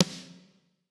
Snare Fat Stereo

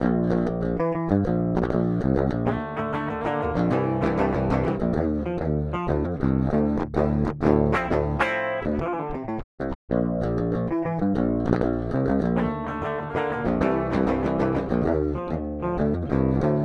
Guitar Input Comp

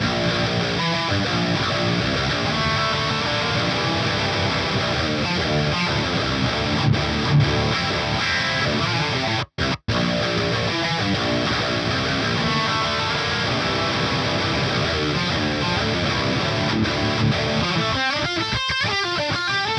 Guitar Transient

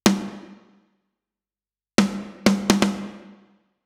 Snare Process; Illusion

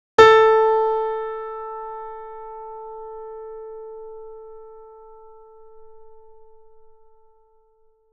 Key A Non-Linear Dist

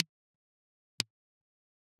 Tom Spiff

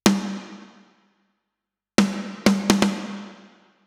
Snare Plate; Snare Process

